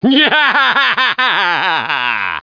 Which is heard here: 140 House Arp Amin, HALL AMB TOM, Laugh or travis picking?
Laugh